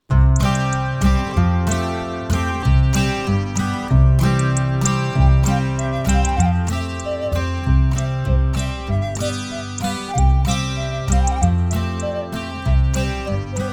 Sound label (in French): Tic tic le rougegorge instru; cuicui et compagnie